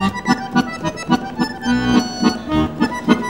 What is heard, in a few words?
ACC LINE, MELODIC; Best Service; World Colours